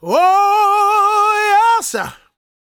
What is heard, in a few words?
GOSP MALE, Vocal Planet Gospel